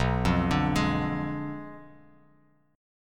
B Diminished 7th B down Bass Standard; Bdim7 arpeggiated

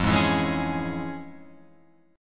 F Minor Major 7th Sharp 5th Guitar Open F; strummed; JGuitar

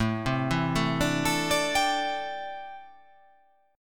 A Minor 11th A, Chord

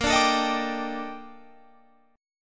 A sharp Major 13th A sharp Guitar Open F; strummed

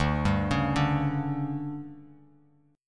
D Major 7th Suspended 4th, D, down, Bass Standard; arpeggiated

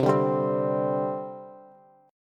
C Suspended 2nd, C, down, Bass; Csus2 strummed

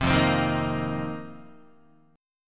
F 7th Suspended 4th F down Guitar; strummed

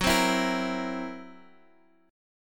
C 7th Flat 5th, F sharp

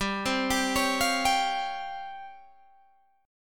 C sharp Major 7th Flat 5th, chord

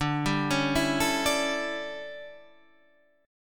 D Major 7th Suspended 2nd Suspended 4th, chord

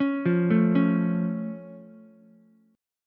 F Augmented F Ukulele; arpeggiated

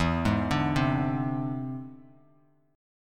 E Augmented 7th E down Bass Standard; arpeggiated

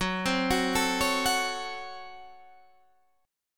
Db Major 7th Suspended 4th Sharp 5th Gb

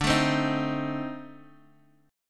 C Minor 6th, D, chord

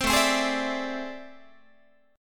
A-Minor Major 11th Guitar, AmM11 chord; strummed; JGuitar

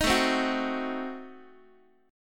A Diminished 7th A down Guitar; Adim7 strummed; x,x,6,7,4,5; JGuitar

